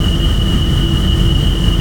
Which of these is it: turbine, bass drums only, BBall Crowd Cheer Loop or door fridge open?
turbine